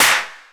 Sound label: Snare